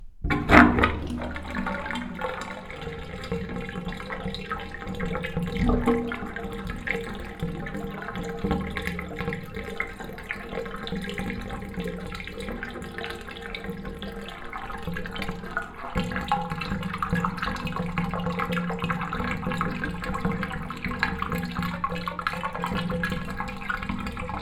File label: Good drain